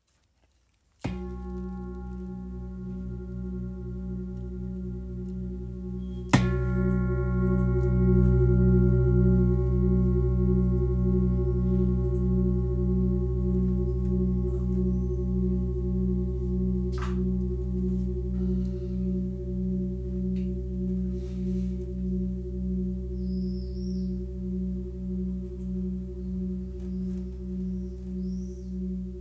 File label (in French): Gros bol si 8.3kg; gravure pieds